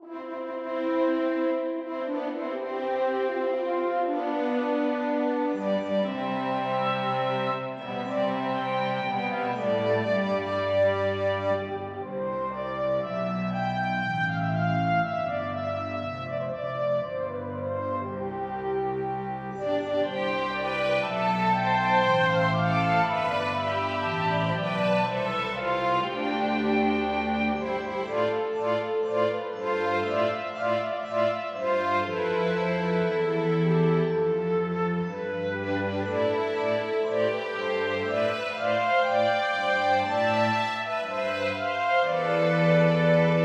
main menu fanfare loop, MIDI; remake; OGA-BY Remakes

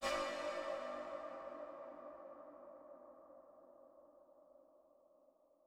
excited horror sound

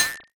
iron 8bit sound effect; ferro

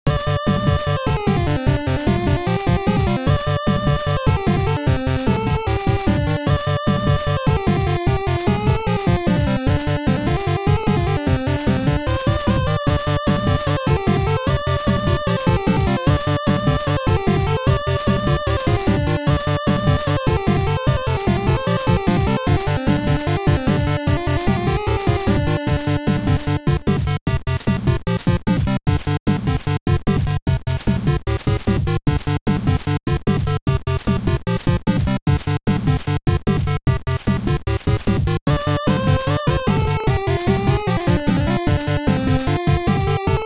Australis Frontier (Loop)

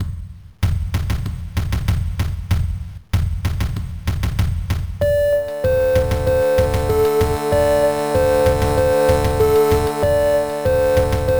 forest

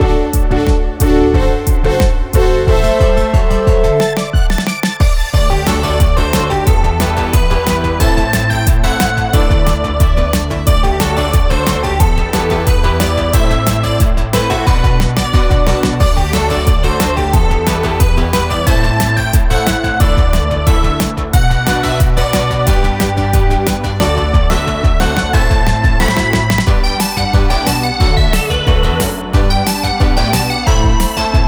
fairy battles bpm180

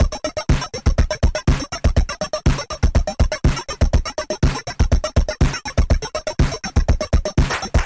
SWINGING PERC, electronic music parts; Swinging Through Trees

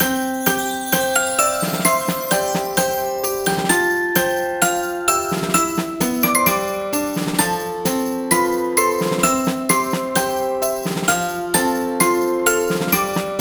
wintery loop

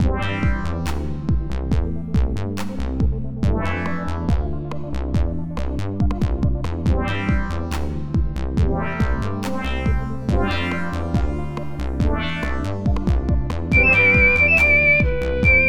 village

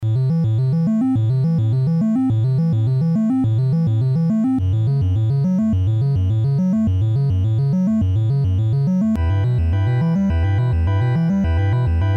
8Bit Action, Soundtrack; Bogart VGM; Menu Select

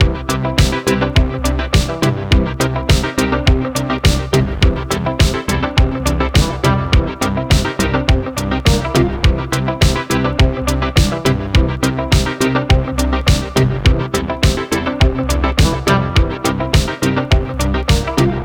funky menu loop

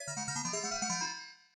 magical

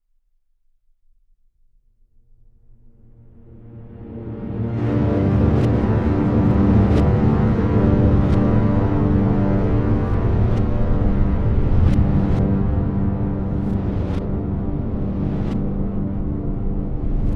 ambient pad; Gregor Quendel

